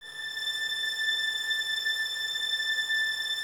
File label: violins A 6, string ensembles; Miroslav Vitous